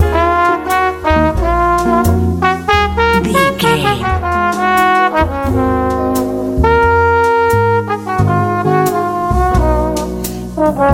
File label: jazz